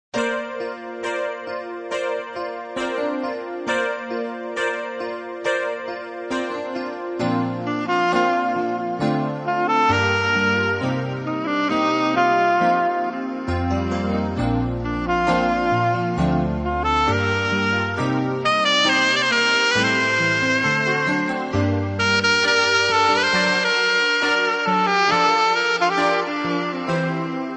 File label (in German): dr Blues